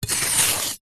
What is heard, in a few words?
knife scrape dirt